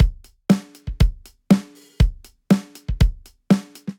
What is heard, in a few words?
Towel Kit, Drum Kits; Ableton; Drum Booth